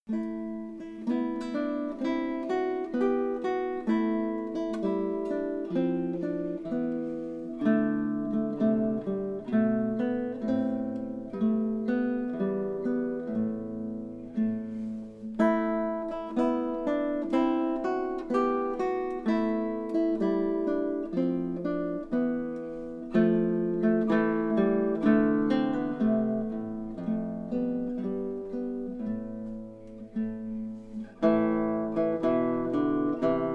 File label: Chant, classical guitar